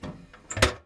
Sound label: Vehicle Hatches; Red Orchestra 41-45